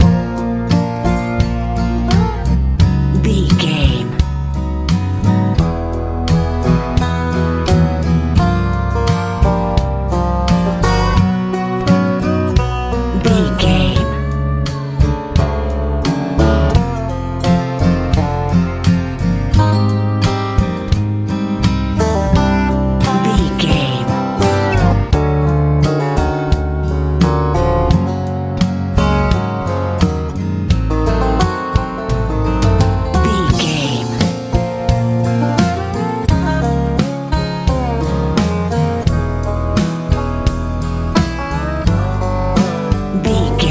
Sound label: country ballad classic